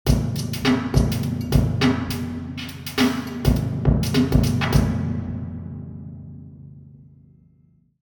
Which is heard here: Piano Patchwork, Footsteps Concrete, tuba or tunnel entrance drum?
tunnel entrance drum